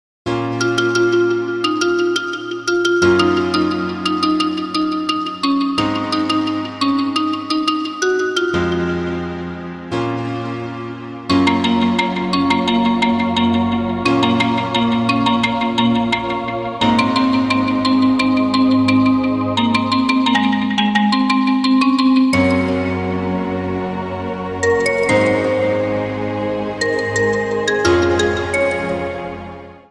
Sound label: instrumental